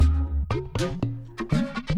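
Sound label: Music Dpad Base 120; From Dust; PS3; Xbox 360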